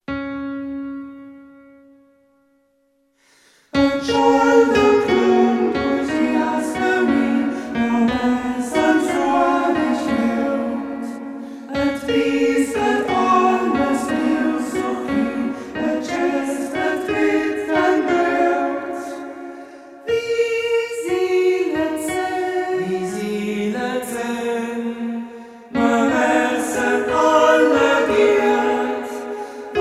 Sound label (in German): Chor